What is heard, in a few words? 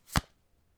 card Place, Casino; Kenney's Sound Pack